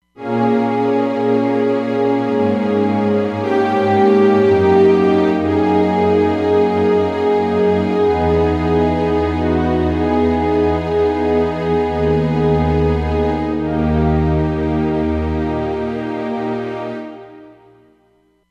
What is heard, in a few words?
Soft Orch, Roland Fantom XR